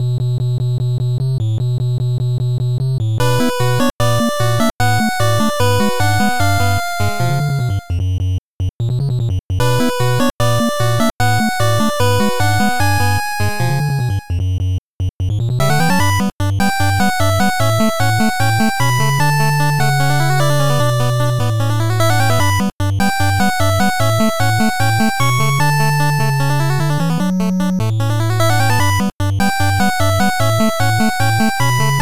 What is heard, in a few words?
8bit Enemy Battle; 8bit フリーBGM; 通常戦闘曲; めっちゃFFっぽい通常戦闘曲; ファミコンのFFとゲームボーイのサガを意識して作りました。後半はやや明るくなり、味方の士気が上がるようなイメージです。; ツクール用